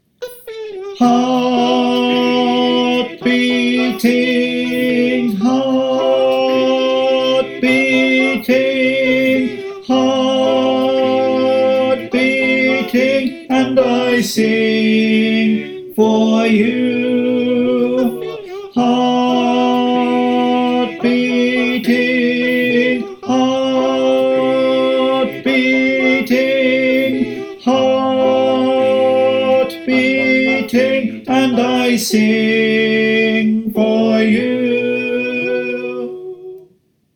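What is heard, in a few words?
tenor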